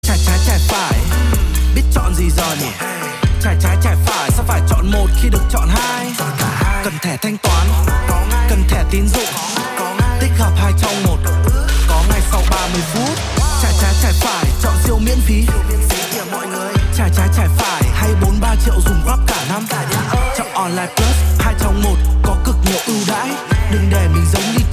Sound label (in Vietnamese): rap; nhac chuong